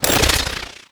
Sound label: panga